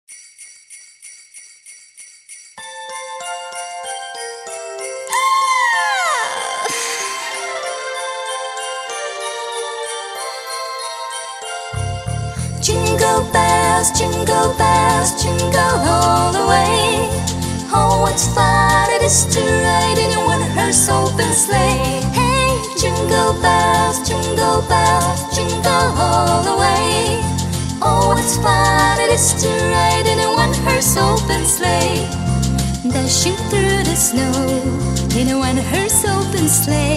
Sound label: jingle bells na 3 jazykah